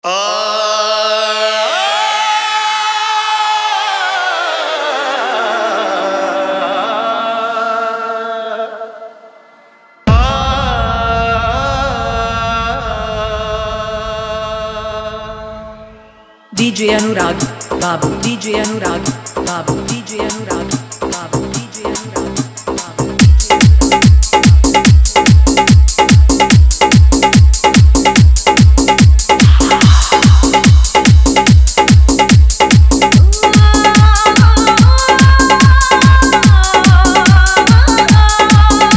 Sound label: Hard Dhollki Bass Mix, Hindi Dj Song